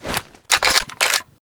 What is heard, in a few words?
rg6 reload, weapons